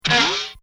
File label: Comedy Effect Boings; Funny Sounds